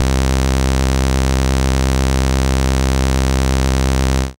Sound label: SID Bass C; CHIPSHOP HITS